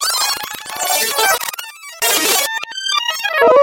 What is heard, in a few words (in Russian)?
zvuk peremotki plenki